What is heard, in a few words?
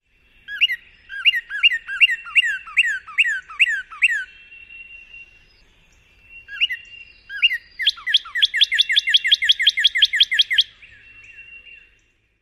songs